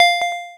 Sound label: wobble